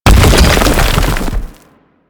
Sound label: Thumper Bot Thumper Impact Frack Rumble C; Warframe; Grineer